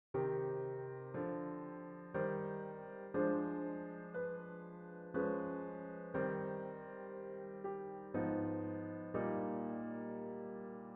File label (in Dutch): gealtereerd leidtoonverplaatsing enharmoniek